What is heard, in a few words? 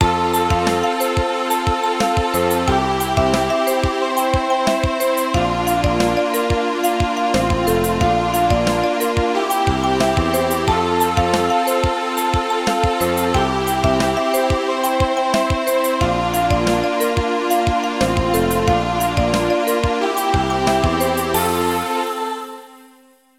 GAME OVER; TWIN SPIN